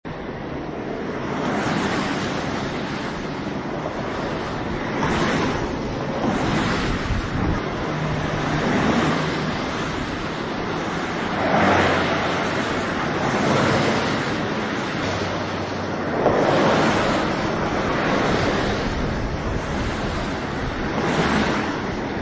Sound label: Highway